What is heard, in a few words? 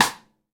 rimshot; osu